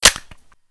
p90 clip tap; weapons

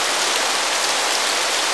waterfall medium loop

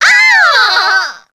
succubus dying; queen girl

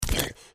trog foot run, street trog

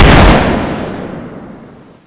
fire, weapons; cso; turbulent5

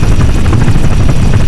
rotor; falcon

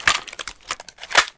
shotgun single reload

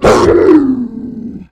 attack

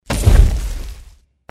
jump up, power armor; clothing system

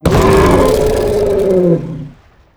death

